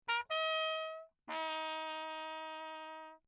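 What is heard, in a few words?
trumpet mute Lechner signal; Perinétventiltrompete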